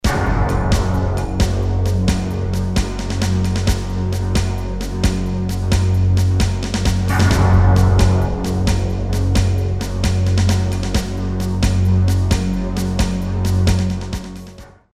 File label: musicbed sample, industrial